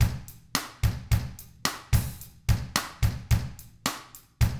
POP, Drums; ILIO; Double Platinum Drums 1

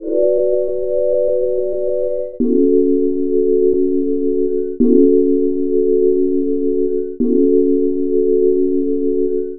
Horizon Keys; Sonic Foundry (Sony Creative Software); Club Joints and Street Anthems

